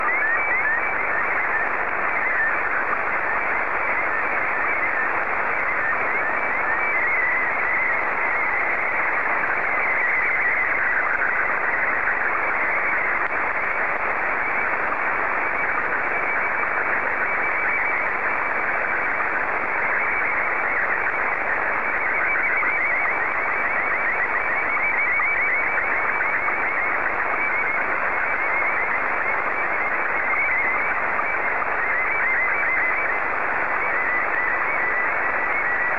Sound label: sstv; VK4MSL SSTV RX @ QG62ln